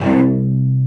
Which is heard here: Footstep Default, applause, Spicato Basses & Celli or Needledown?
Spicato Basses & Celli